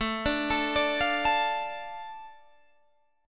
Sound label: A Suspended 4th Sharp 5th, A, Guitar, Standard; Asus4#5; arpeggiated; capo=2